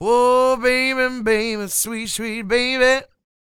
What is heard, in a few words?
SING W, Vocal Planet Blues & Soul, BLUESY; ILIO